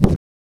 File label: muted bong; sgi